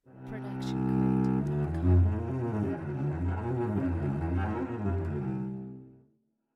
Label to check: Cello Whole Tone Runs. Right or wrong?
Right